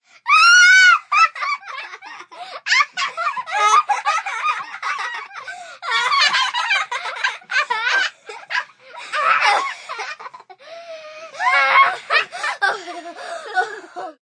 Kids Laughing, Children, two, laughs, squealing, long, kids, cute, speaking, (human voice); Talking Sound Effects; Sound Effects:Voice; VoiceBox